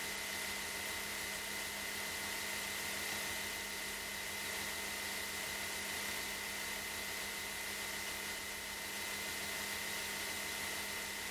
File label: Min Fan Speed; Frequency Plots (Mid Speed); super flower megacool 120mm pwm fan